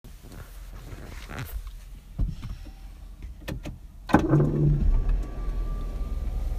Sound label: strange noise start up; glc-class x253